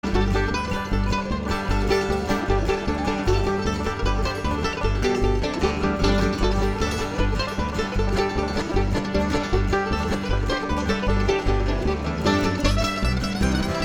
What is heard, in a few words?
New Instrumental